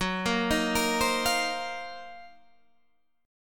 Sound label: D sharp Minor 6th Add 9th F sharp